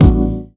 KICKS, Ragga & Dub Reggae; USB Soundscan vol.27; AKAI

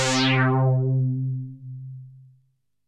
PEPPI BASS, synth bass; TECHNO-BOX

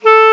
KLARINETT, Alpine Volksmusik; Best Service

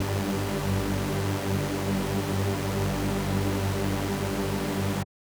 SuperSaw G3; SuperSaw1; KG MegaSaur; M8

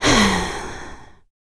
Vocal, R&B & Dance, FEM. HUMAN

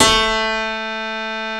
GRAND A2, PIANO

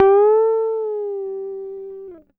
GTR TRIK, Jazzistic; Univers Sons

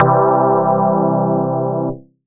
Attack Organ Chord; Big Fish Audio; OSH Chords

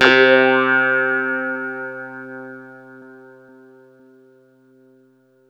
CLAVINET; Ragga & Dub Reggae

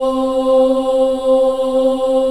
C3 CHILD OH, Choir; USB Soundscan vol.28; Acoustic & Synth; AKAI

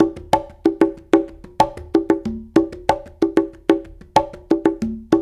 93 Brk Dr Reggae; Spectrasonics; Supreme Beats; World Dance

